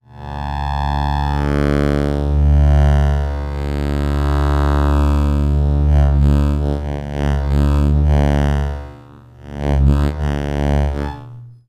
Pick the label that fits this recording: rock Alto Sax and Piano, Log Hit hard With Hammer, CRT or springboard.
CRT